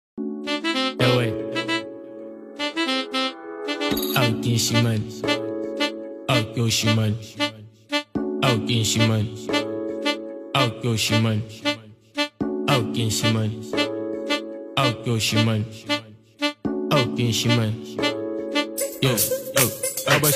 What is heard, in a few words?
Amapiano